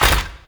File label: Melee Step; Warframe; Necramech